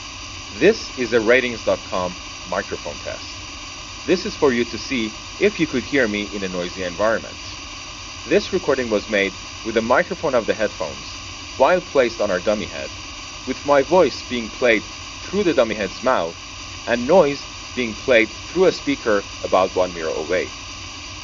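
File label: Bluetooth PC noise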